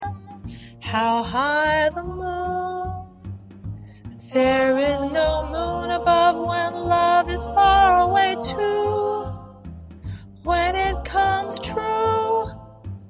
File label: Soprano Lead; jazz tunes; VOCAL PARTS; Soprano/Lead part preview